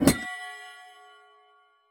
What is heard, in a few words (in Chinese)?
试炼刷怪笼打开遮板声